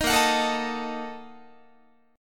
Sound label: A sharp Major 7th Suspended 4th Sharp 5th, Guitar; strummed